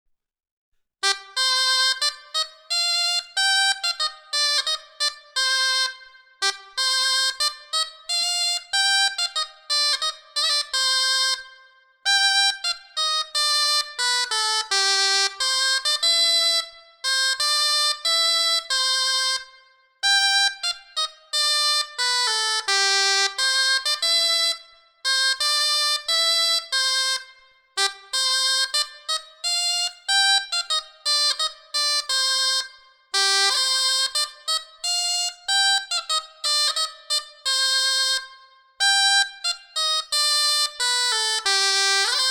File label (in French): clarin, Darrer eth noste horn